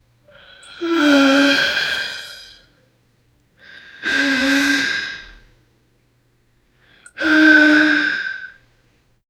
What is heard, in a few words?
man lets out a wet, fart